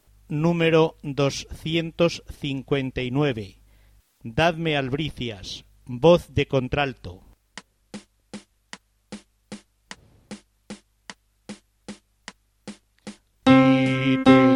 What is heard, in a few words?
Contralto